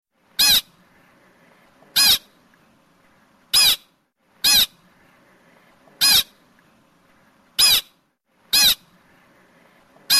American Pika; Chris Ray

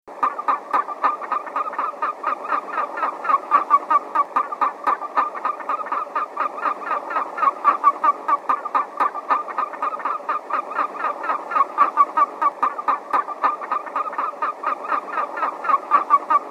Black Toad; Davidson; Sherman

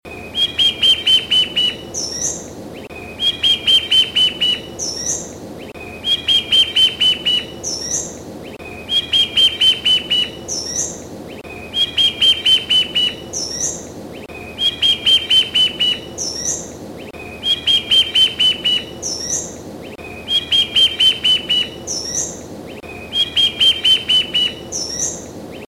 Fringe Backed Fireeye; Richard Hoyer

